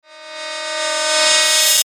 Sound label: Ascend Electrical Swell; Blastwave